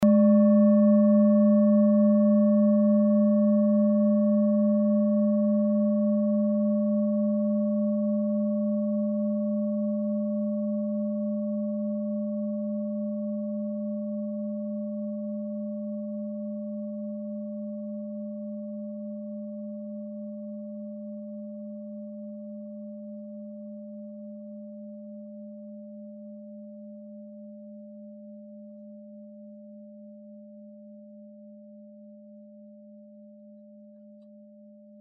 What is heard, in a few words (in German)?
klangschale orissa; Die Klangschale kommt aus einer Schmiede